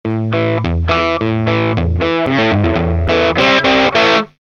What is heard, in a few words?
Rat2 West Coast Rock, Guitar Effects Pedals; Pro Co